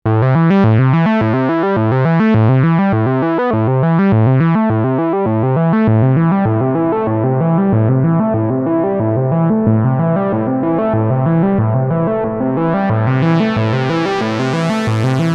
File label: Moog Grandmother